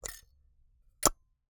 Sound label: Camera, Argus, aluminum, steel, professional, fully mechanical, film compartment, open close; Eiravaein Works; Vaeyan IV